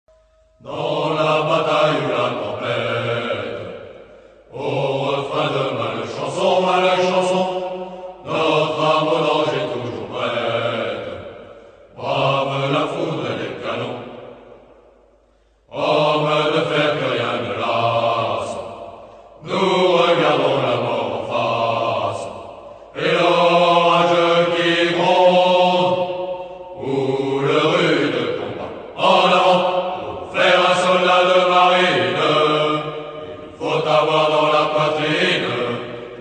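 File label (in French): HYMNE INFANTERIE MARINE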